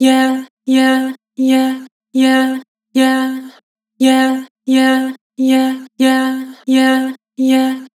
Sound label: "Yeah", Vocals; Harmor